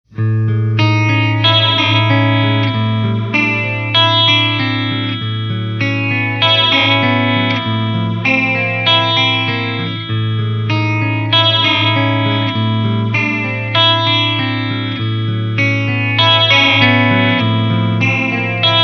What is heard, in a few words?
mellow phaser